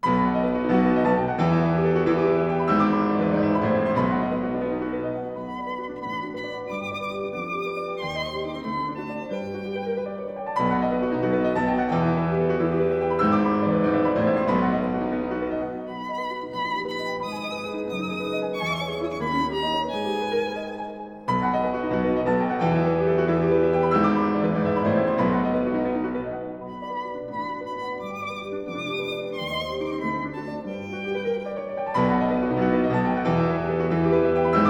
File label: Variations for flute & piano in E minor